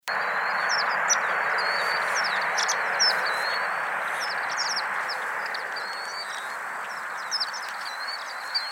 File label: Horned Lark; Xeno-Canto